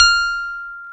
PIA Elec. F6, CP70; Sound & Vision; Gigapack I CD 2

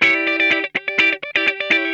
E PEDAL B, Funk Guitar; 90 s Sample CDs; Zero G

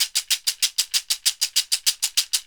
abacus lo, World & Orchestral Percussion; ILIO; Synclavier Percussion 2

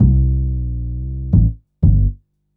Upright Synth Bass, Drum & Bass; Fluid Dynamics

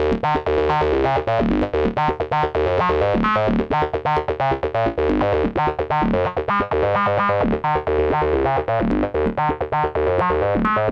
FUNKY, SYNTH SEQ; AMG; Global Trance Mission VOL-2

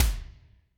Kik Lo Wet Wisp, Alesis D4, Drum Machines

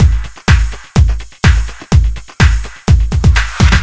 Fill, Drum Loops 125bpm, Nu Dance Electro

